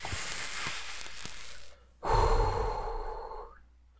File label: Fumar Smoke